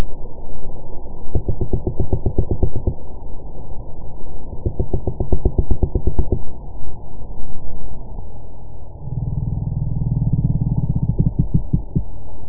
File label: knock haddock